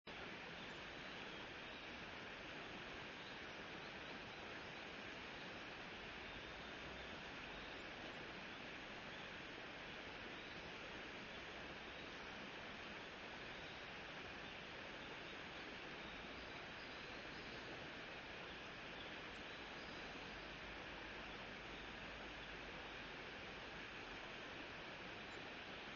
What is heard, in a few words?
riverside